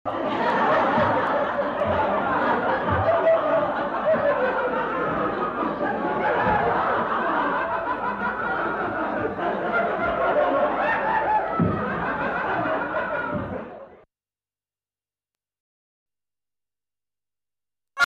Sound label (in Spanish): risas continuadas